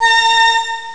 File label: disc string moll; ST-XX Amiga Soundtracker Sample Packs